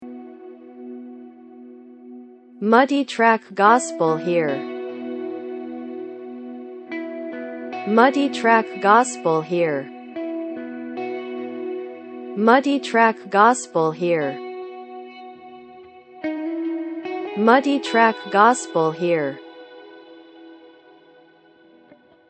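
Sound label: electric guitar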